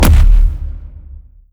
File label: step layer hopdown, dog; npc; scripted